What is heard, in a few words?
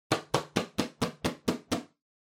Uchiwa Fan